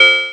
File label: MS-20 FX; Digital Sound Factory